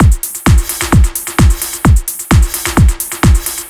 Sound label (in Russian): Beatnik B, евродэнс; сэмпл ударных